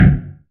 kick sound f sharp key, Royality free kick single hit tuned to the F# note. Loudest frequency: 268Hz